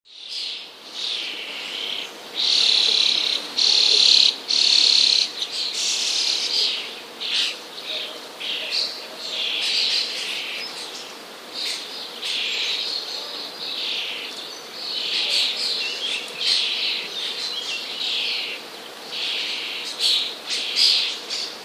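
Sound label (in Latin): sturnus vulgaris, Głosy szpaków - nagrania własne; Stado młodych szpaków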